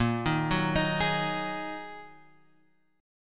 A sharp 7th Suspended 4th Sharp 5th, guitar; A#7sus4#5 Chord {6 6 4 X 4 4} arpeggiated

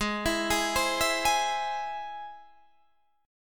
Ab Augmented Ab Guitar Standard; Ab+ arpeggiated; JGuitar

